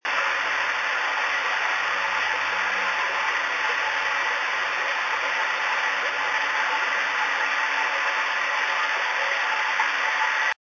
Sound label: toilet